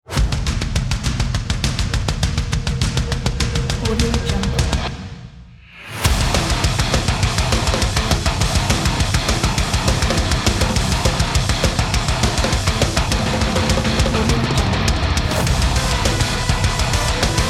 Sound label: alternative metal combat sports